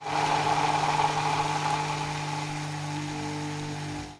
batiendo huevos en minipimer

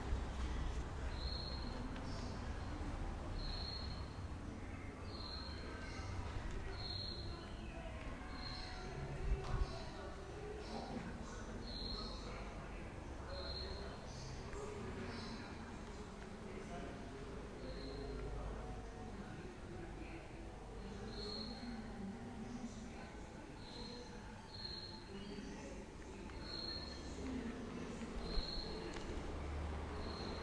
sonido de un patio gaditano restaurado

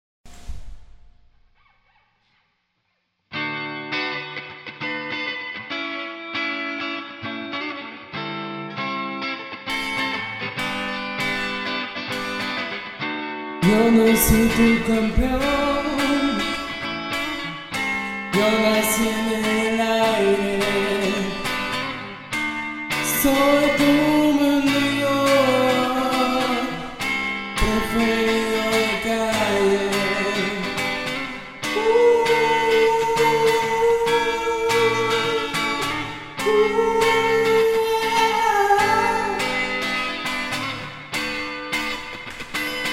cantandibiri con mi guitarra

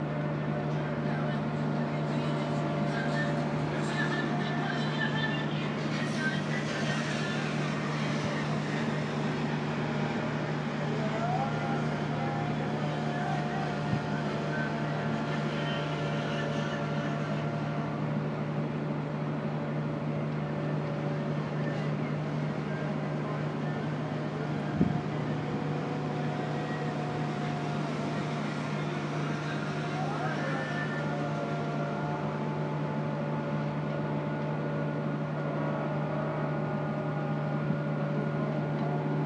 sonidos de Doha

